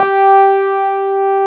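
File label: Wurlitzer G3 - R; USB Soundscan vol.09; AKAI; Keyboards Old School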